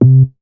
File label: Moog; leo-sounds